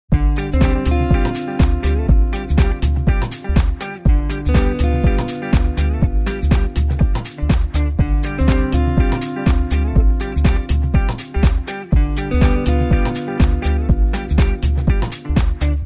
Funk Sprocket

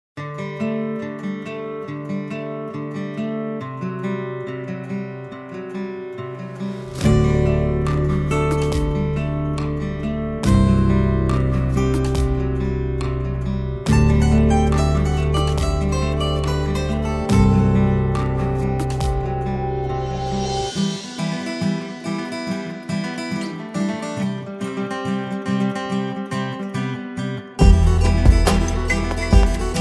Chillstep Acoustic Guitars

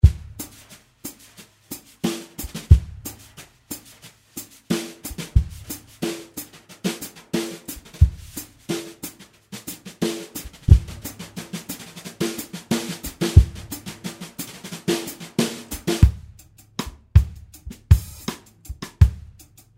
Indie Folk Drums

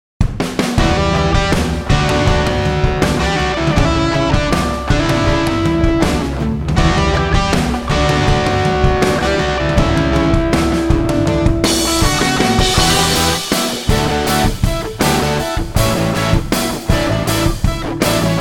Rock Guitar

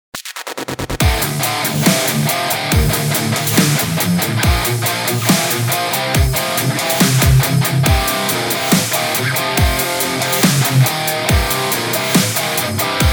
Metal Attack

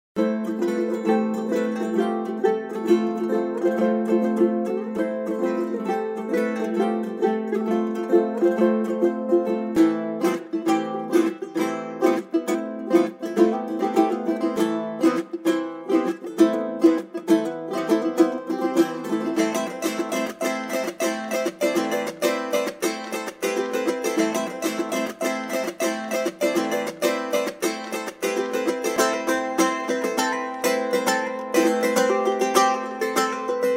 Ronroco

